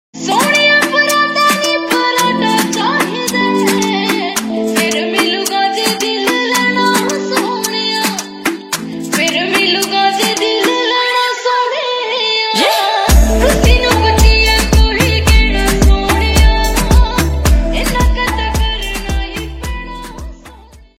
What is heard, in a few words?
new punjabi song ringtone